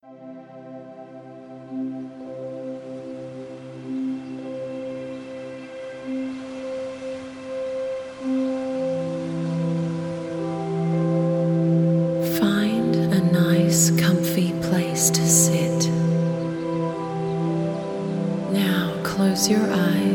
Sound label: Calm Meditation